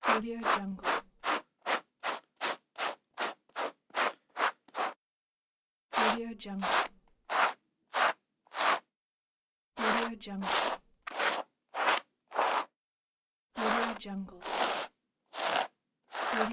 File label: brushing hair